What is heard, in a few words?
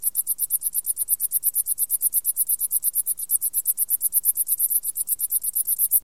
Neoconocephalus ensiger; Arthropoda